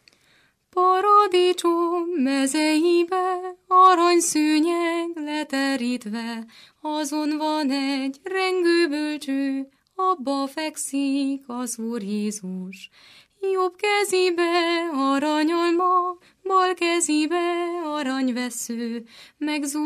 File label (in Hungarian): nepdal; paradicsom mezeibe; nepdalok